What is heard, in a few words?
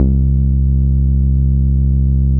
BASS C3; JGL BASS; Jungle; USB Soundscan vol.05; Explosive Jungle; AKAI; 90s sample CD